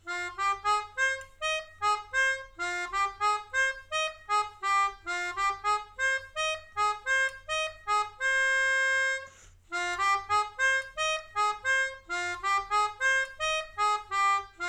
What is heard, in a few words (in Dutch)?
Mijn Melodie, Melodica